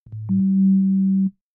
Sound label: Beep Door Beep Tone; beeps